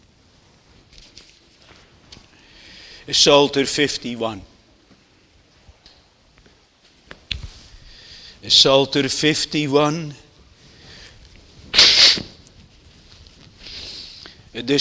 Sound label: Singing; Netherlands Reformed Congregation, St. Catharines, ON